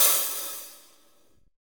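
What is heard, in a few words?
HH HH, Roland, hi-hat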